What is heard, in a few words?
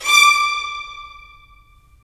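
strings violins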